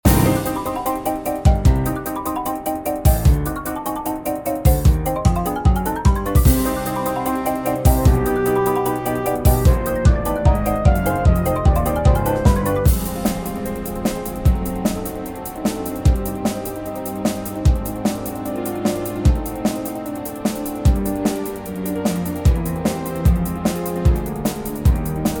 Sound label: encounter musician; Manamon Music; Manamon 2